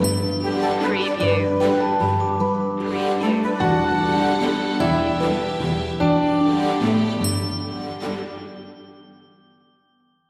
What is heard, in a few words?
regal waltz